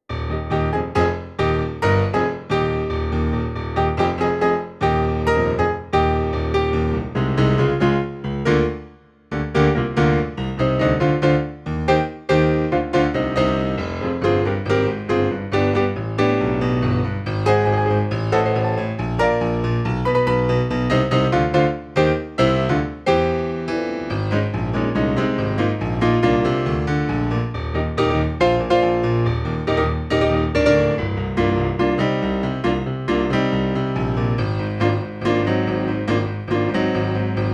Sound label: accompaniment